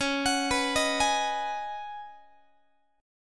C sharp 7th Suspended 2nd Suspended 4th C sharp down Guitar; C#7sus2sus4; arpeggiated; JGuitar